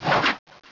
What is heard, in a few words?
Crush Claw; pokerogue; battle anims